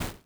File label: step snow